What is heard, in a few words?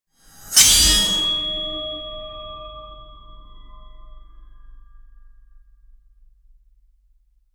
mystical sword slashes; weapons